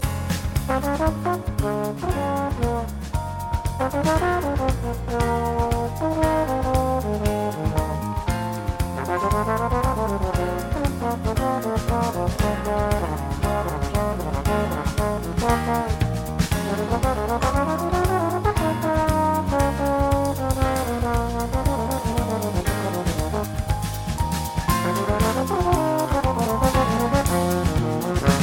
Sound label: Solo Only